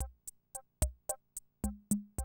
CR78, DrumLoops

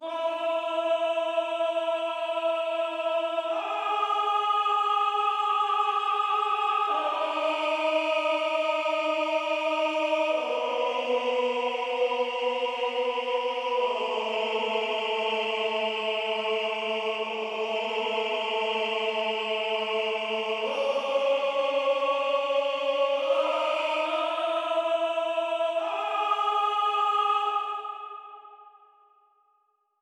male oh legato

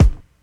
BD; Best Service; Dance Mega Drums

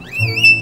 VIO MISC, MISC VIOLINS

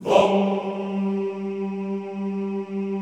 VOHMMM G2, Classical Choir; Best Service; Extended Classical Choir

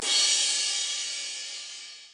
cymbal, Kurzweil K2000

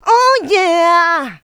Vocals, Adlibs; Houseworx

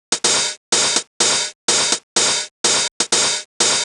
drum loop room hat 125; Sample Magic - Classic Chicago House; Drum Loops

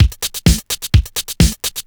Clubhouse Loop 128 BPM

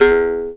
E JAW; Big Fish Audio - Didgeridoo and Other Primitive Instruments; JAW SIMPLE

